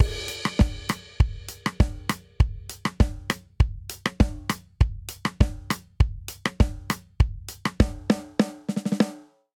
Reggaeton Outro 100 bpm; 4 4; MIDI Clips; Ableton; Factory Packs; Drum Booth

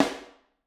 Snare Overhead; Ableton Foundation FreePacks; Snare Designer

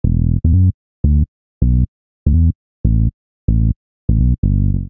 E Minor 86bpm, Bassline; MIDI Clips; Beat That; Ableton; Factory Packs; Chop and Swing

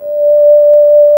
flute D4; Ableton Foundation FreePacks; Foundation Glitch Toys & LoFi

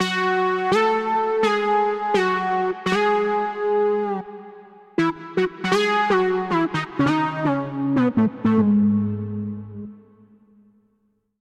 12 Voice Brass C# Minor 85 bpm; MIDI Clips; Melodic; Obee; Ableton; Factory Packs; Chop and Swing

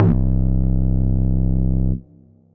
Hopped Bass; Ableton; Factory Packs; Chop and Swing

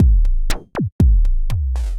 ARP 2600 Drums; Ableton; DM ARP 2600 Drums by Flatpack

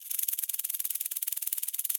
Cabasa FX; Latin Percussion; Drums; Drum Hits; Shaker; Ableton; Factory Packs

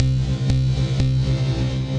Sidechain Pump Wash, Synth Rhythmic; Ableton; Factory Packs; Build and Drop

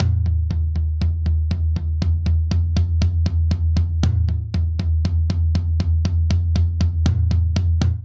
Classic Rock Toms Only 119 bpm; 4 4; MIDI Clips; Ableton; Factory Packs; Drum Booth

